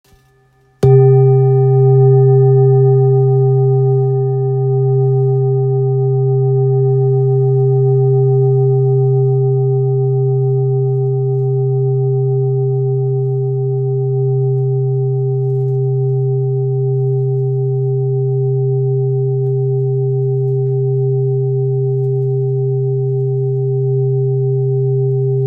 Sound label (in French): Bol thick do 2.25kg